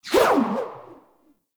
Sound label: Rope Winch Rope Launch; Puzzles